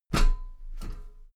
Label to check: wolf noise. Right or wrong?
Wrong